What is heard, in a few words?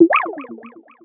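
slime attack; Seer; GamigoNA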